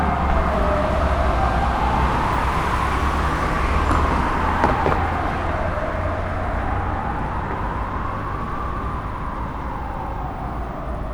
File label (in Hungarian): mentőautó; lehel ter